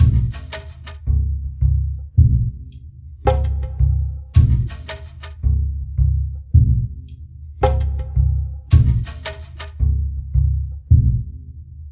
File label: Jungle Level